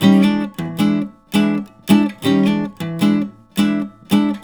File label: RIFFS, Blues Guitar; ILIO; Fretworks